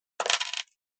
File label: sound of coins dropping; winchester; century ghost